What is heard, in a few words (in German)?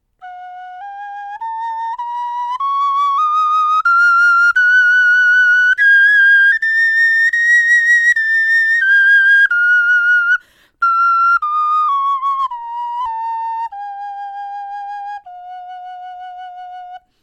tonleiter, knochenfloete